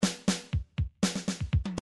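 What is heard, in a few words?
midi drums simple fill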